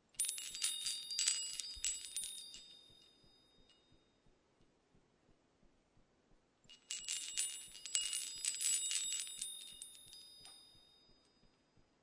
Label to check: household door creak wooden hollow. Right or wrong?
Wrong